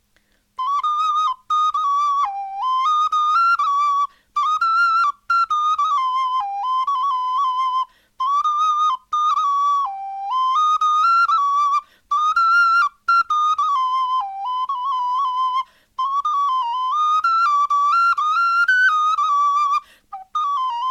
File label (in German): melodie